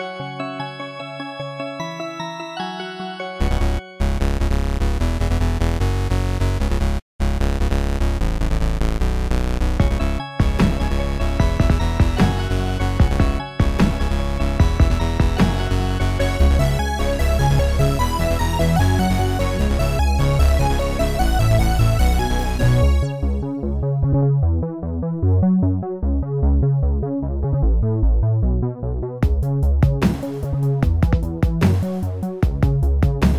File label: Bonus Song; straimium immortaly